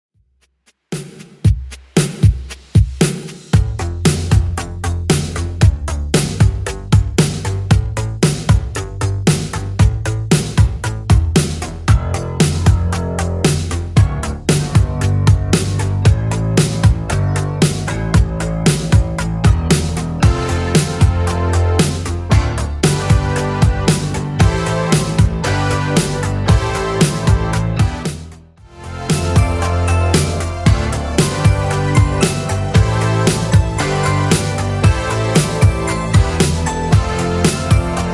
80s Redrum 115bpm

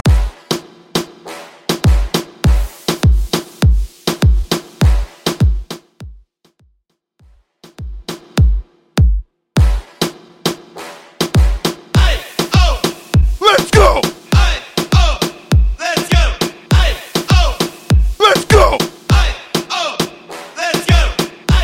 60s Bootleg Party Break Transition; Mainstream VIP; Vegas Edit; 100 122 Bpm

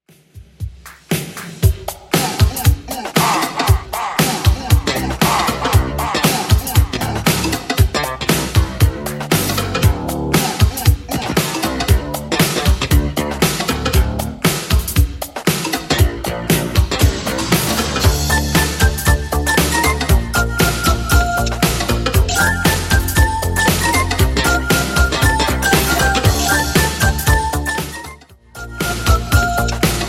80s Redrum 117bpm

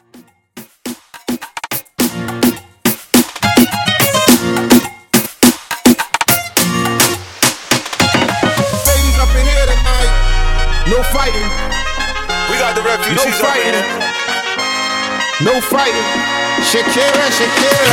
Moombahton 105BPM; remixplanet

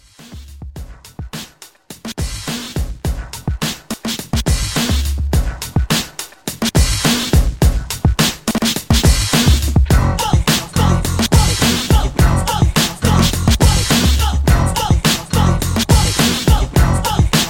80s Re Drum

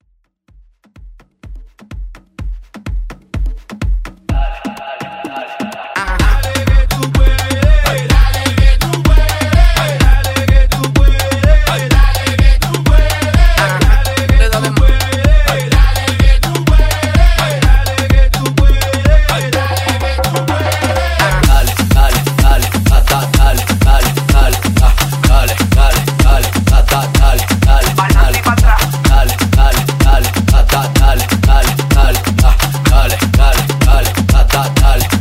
Latin Hype

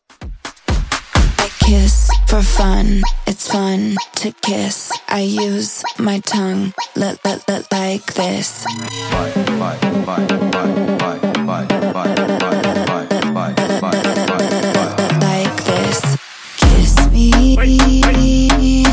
Intro Outro 128bpm

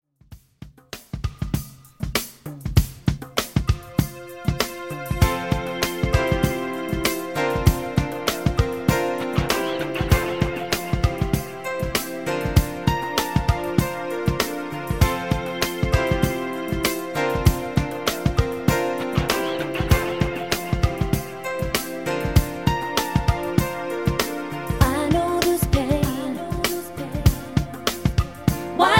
Intro Outro Clean 98 Bpm

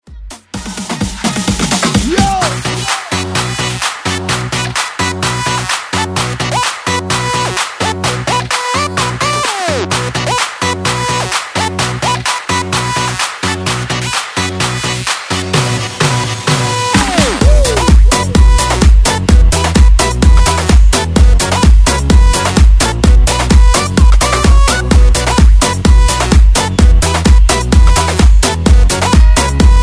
Afrobeat Redrum

